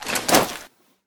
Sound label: throw mag, gun; explodiumgun